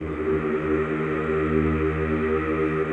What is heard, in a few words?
CHO Yhh, Roland